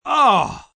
Pain Short Ah, Pain, male; VoiceBox

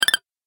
Game App Scurry; video game

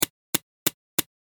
A Series Of Clicks

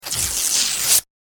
Tearing Paper; Paper Sound Effects

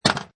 Pinball Sound Chunky Clank; Video Games

